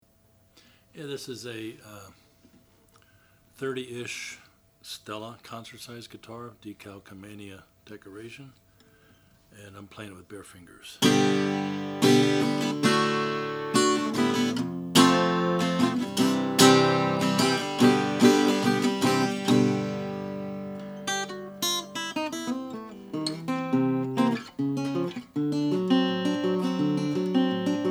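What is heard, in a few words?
oscar schmidt stella decalcomania, Guitars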